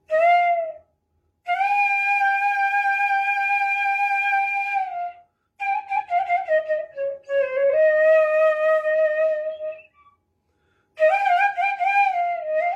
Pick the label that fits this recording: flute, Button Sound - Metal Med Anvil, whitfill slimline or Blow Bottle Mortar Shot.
flute